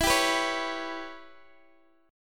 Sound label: E Minor 6th E, Guitar DADGAD